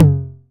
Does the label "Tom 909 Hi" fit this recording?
Yes